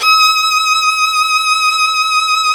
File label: Synclavier Strings; ILIO